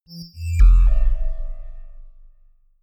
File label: notification synth drop steps; kommunikation; signale